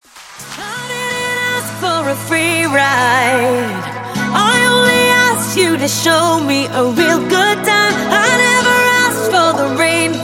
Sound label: Dance Redrum Clean 128